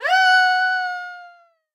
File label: Cry; Peace, Death